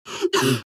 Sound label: Wheezy Cough; The Binding of Isaac